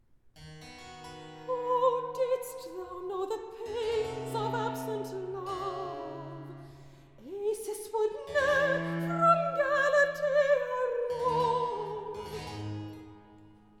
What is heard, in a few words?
Recitative; O didst thou know; Handel Masterworks